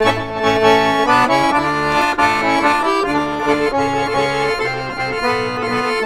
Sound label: ACC, Balkanish Traditions; USB Soundscan vol.63; AKAI